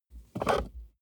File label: plastic plate crevice rub squeak short